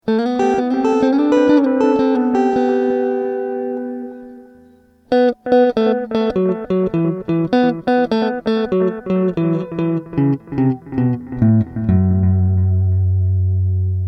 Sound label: PT80 delay